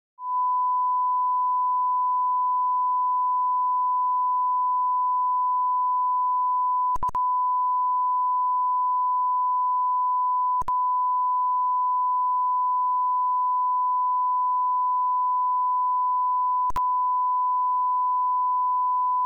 1 KHz - Minus 20 dB - L&R - Tone; The Sheffield - Coustic - Set-up and Test Disc

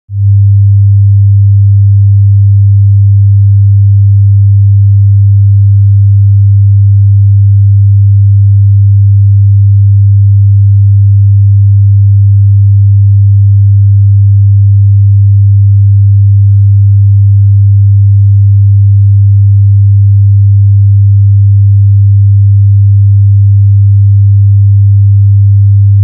100hz - 0 dB - Both; THD vs. Noise; The Sheffield - Coustic - Set-up and Test Disc

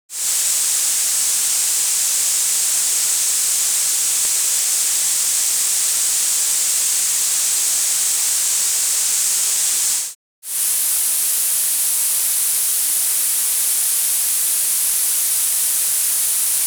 8 KHz - 10 KHz - 12.5 KHz - 16 KHz - 20 KHz; The Sheffield - Coustic - Set-up and Test Disc

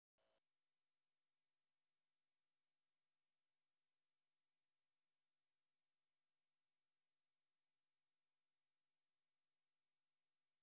10hz - 0 dB - Both - Frequency Modulation; The Sheffield - Coustic - Set-up and Test Disc

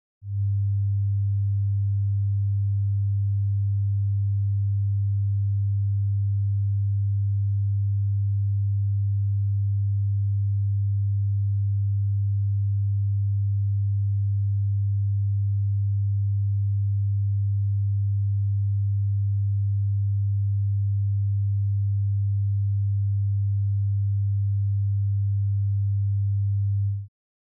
100 Hz - Minus 20 dB - L&R - Tone; The Sheffield - Coustic - Set-up and Test Disc

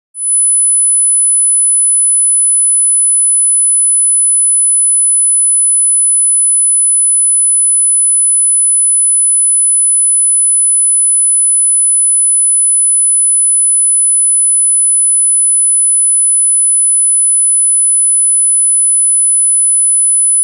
1 KHz - 0 dB - Right; Channel Separation; The Sheffield - Coustic - Set-up and Test Disc

